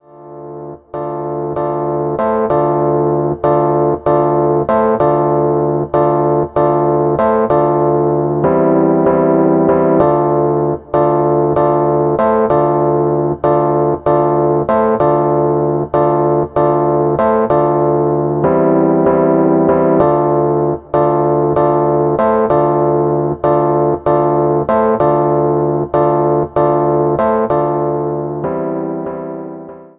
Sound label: Rhodes chord, hip hop chords; rhodes chord 06